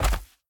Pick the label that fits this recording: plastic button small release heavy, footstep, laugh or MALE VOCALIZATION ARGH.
footstep